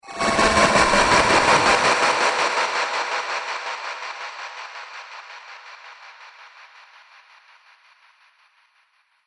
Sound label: Laser Future Dark Future Scene; Sci-Fi Laser Sound Effect; Andrew Fleming